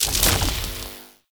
Shock Enemy Impact

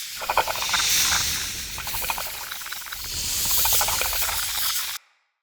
Wevetwood Jug Fumes Loop; Bromius

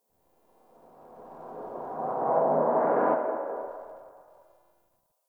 Backwards Whisper Yell; Warframe; Entrati

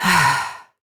Breath Relieved Adult; New War; Adult Operator

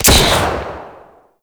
Sidearm Shot; Wraith

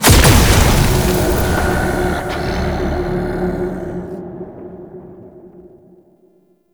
Portal Shatter Open; Warframe; Duviri Murmur; Ambience

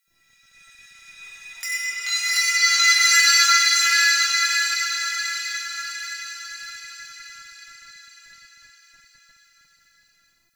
Metal Chimes; Ambience; Warframe; Nokko Colony

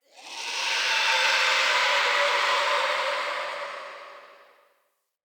Amb Wraith Night Screech; Ambience; Mendicant Area

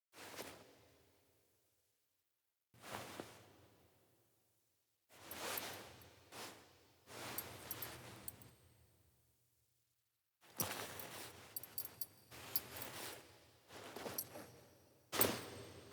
Wake Up Foley; Cinematics; Bromius; Bromius Quest Wake Up Poppet

